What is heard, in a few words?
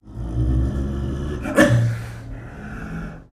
Leopard Snow Growls; big cats